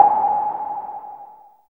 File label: JUNO NOISE, JUNO PERC; Drums In Your FACE!